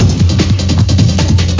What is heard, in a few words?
breakbeat; algomusic; rhythm